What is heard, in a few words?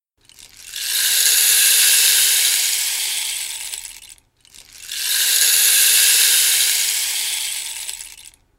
rainstick